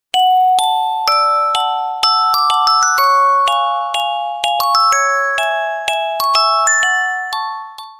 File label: Sweet Ring; Iphone4; meloboom